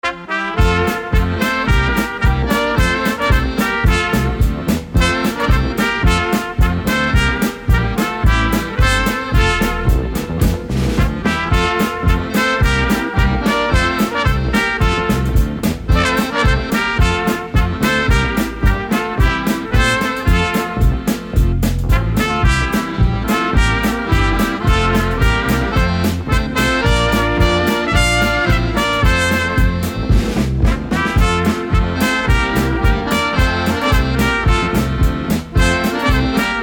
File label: Polka